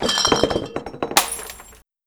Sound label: Bottle Break; Lucius III